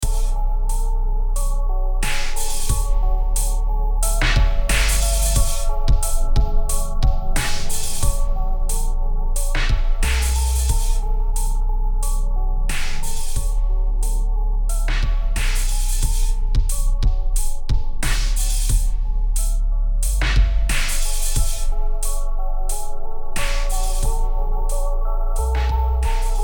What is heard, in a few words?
Simple Conversation Gruff; Blackgate; macOS; Windows